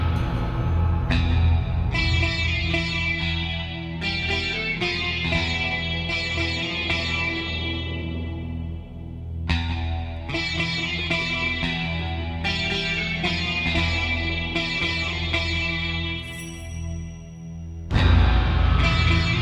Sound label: guitar only